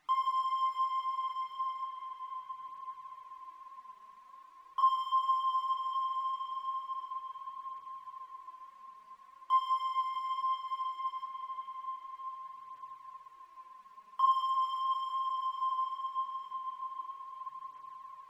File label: 102 BPM A Min MemoryMoog FX; Synth; Cymatics; Fantasy Synth Sample Pack; Final Destination